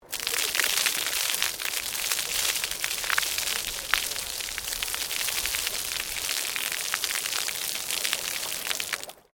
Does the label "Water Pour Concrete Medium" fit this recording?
Yes